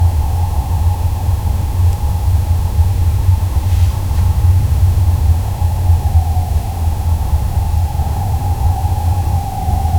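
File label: gentle asmr winter ambience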